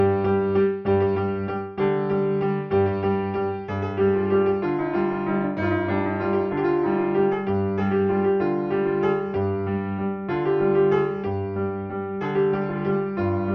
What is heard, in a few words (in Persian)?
Chaharmezrab-e Zabol, piano; Segah; Radif Mahjoubi